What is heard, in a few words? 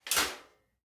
Metal Footfall; soundscrate